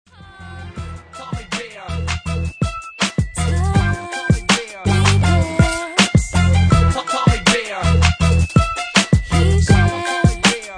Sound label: rap